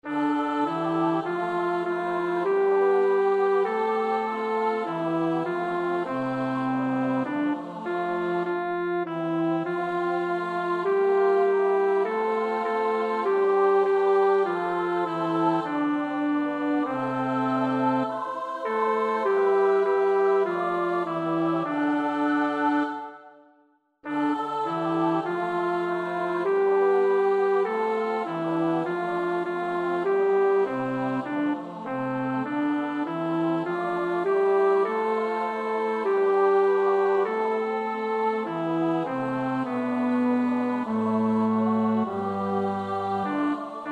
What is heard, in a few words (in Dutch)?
bas